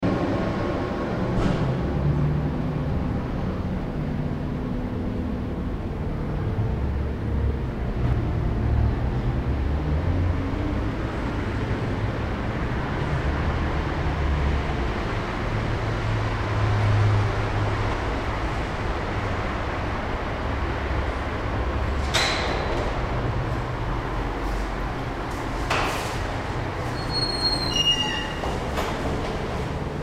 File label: atmo germany berlin marienkirche entrance